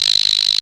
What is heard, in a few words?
technox perc; Quasimidi Technox